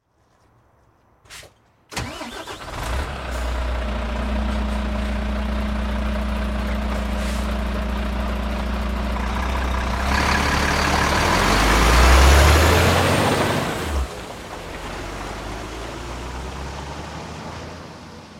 start up pc

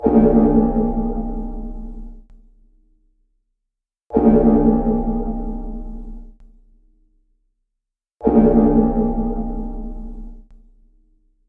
Cloisterbell 10DB

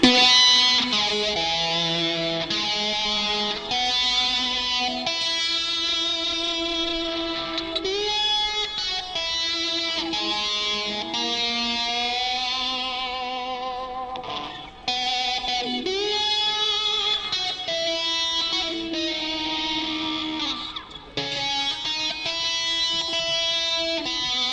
us anthem

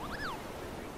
Moogle Noise

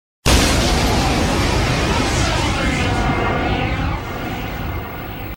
explosion; persona

